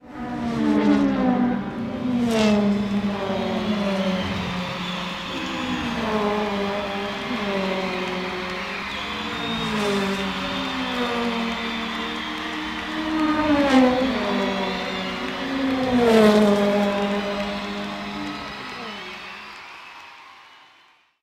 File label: Startup; MK8